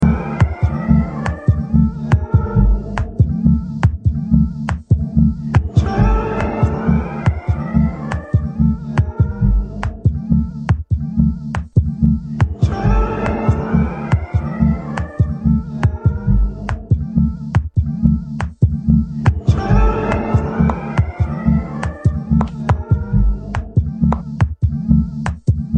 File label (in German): klavierwerke